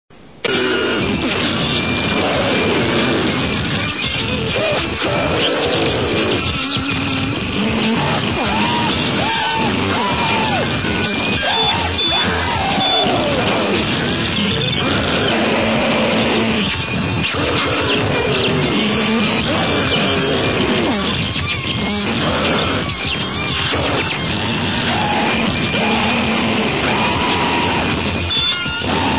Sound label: flaming guitar